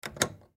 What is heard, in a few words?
Radio Push Button Antique; Technology; Miscellaneous Electronic; Blastwave